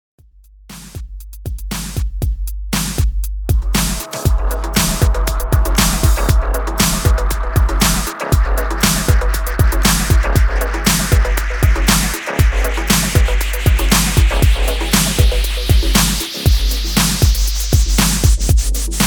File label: HALLOWEEN BOOTYMIX 118 BPM